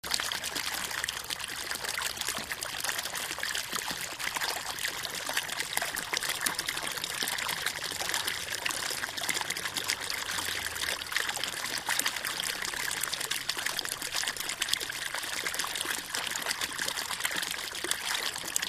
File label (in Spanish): parques norte piletón llenándose